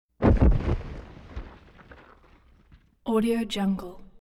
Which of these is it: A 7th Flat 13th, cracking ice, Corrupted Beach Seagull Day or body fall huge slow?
body fall huge slow